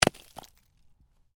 Ice: medium chunk of ice lands on hard surface; Snow; Snow Sound Effects; Stuart Duffield

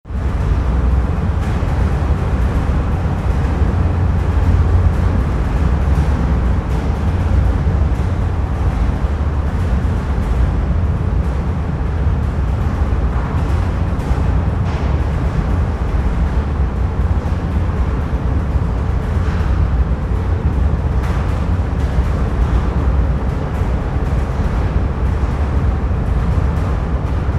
Ship Large Ferry Car Deck with Vibrations; Tom Hutchings

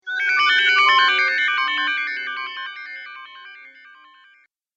Magical Transformation; Magic Sound Effects

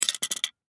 Can Opener Set Down; Foley; Set Downs; Objects; Blastwave

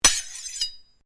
Blade Swish Low, Sword

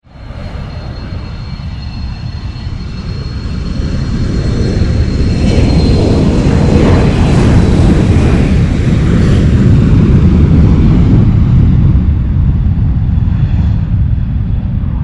Jet takeoff, Jet Taking Off, Engines Rumbling; Airplanes; Vehicles

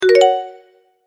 multimedia tone advance

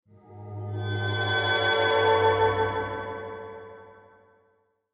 Horror Swell, Horror Swell Descend High Delay; Scary Soundscapes; Blastwave

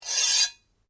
Blade Scrape Medium, Sword

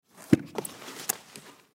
Violin Case Remove Violin; String; Blastwave

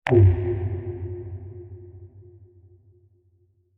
Deep Digital Impact; Impacts; Crafted Signals

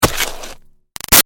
Snow Impact Gritty Icy Scrape; Weather; Big Room Sound

